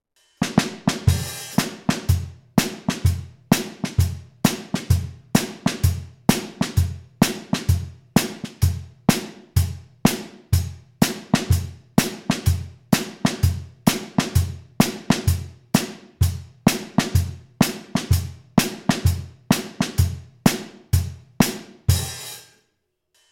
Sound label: Cranborne EC 1 Drum Set Cream